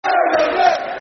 Going Under, Going Under [Football Chant (Soccer Songs)], Port Vale; Port Vale Pvfc